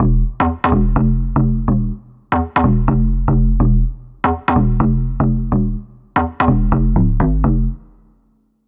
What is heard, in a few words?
125 BPM, Em; Unison MIDI Box